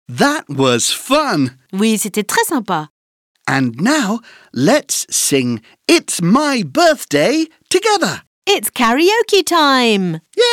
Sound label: song The happy birthday song Karaoke; I Love English for Kids